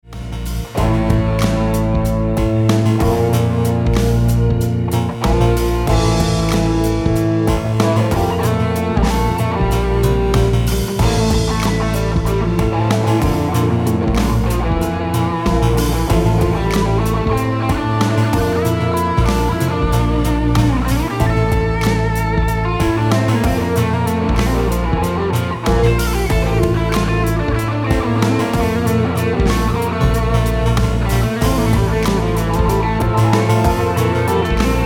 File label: solo, guitar